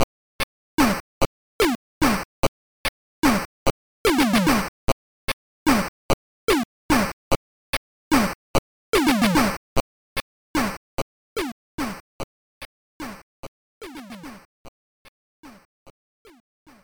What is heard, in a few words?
Main Music; Woody Pop Game Gear